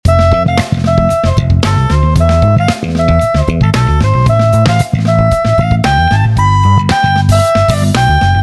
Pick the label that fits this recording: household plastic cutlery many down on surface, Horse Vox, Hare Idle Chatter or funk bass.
funk bass